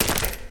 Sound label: movement run; smite; Arachne